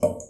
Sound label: water dripping; Apotheorasis